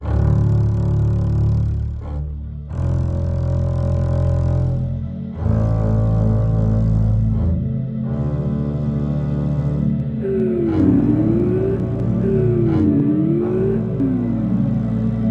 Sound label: drone